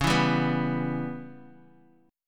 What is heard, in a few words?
Db Minor 7th Flat 5th, Guitar, Open D